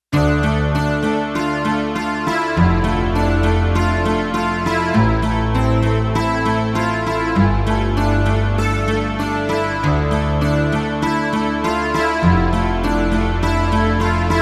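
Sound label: Bright Guitar Strum; Digital Synthesizer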